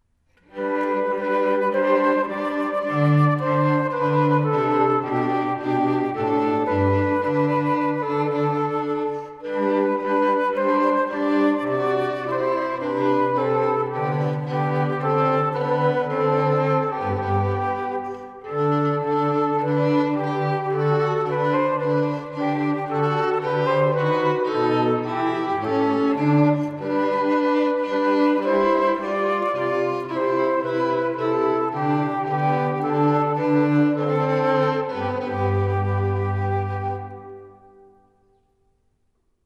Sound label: Flute Ode To Joy